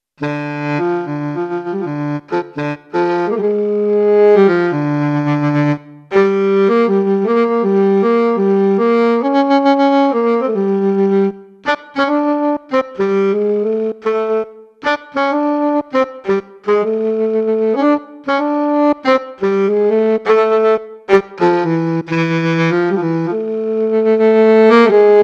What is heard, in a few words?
sax